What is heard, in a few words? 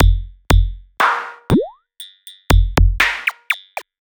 Synthbeat